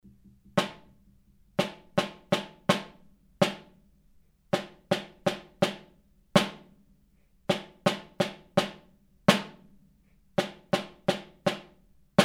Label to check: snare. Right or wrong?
Right